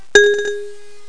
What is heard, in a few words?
xphone, notes; ClickArt 300,000